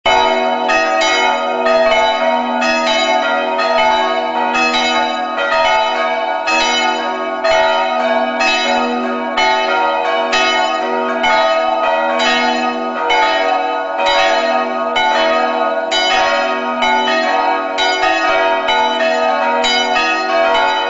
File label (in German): kirchenglocke; adelsberg